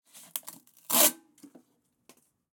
printer; sonniss gdc

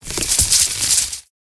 chain lightning secondary strike; psfx; 3rd level spells; call lightning

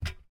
footstep metal grill walk barefoot male; sonniss gdc

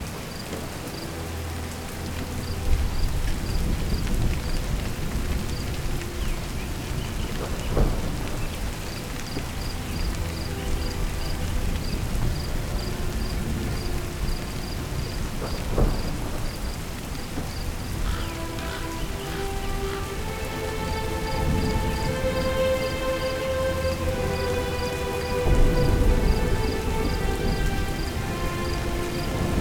ambiance storm; the dranik

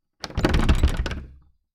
wooden test clean, doors

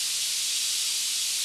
steam loop

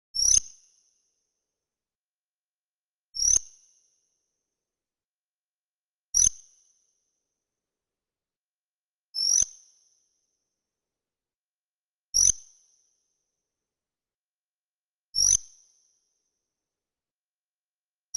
ui scroll; Sonniss GDC

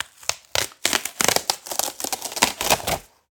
box rip; sonniss gdc

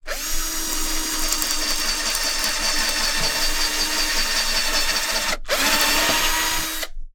screwdriver screwing mono; sonniss gdc

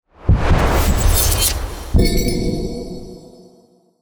misty step complete generic; 2nd level spells

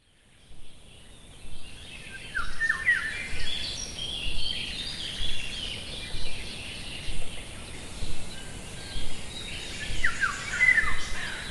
forest birds; Sonniss GDC

